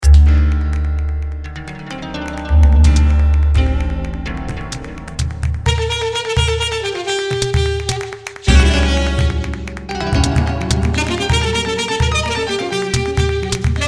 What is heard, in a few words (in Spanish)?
Bule primer Eb